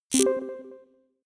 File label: notification bleep; signale